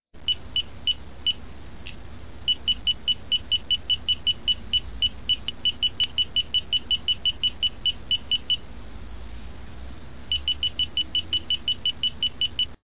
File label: radar sms ringtone; iphone 4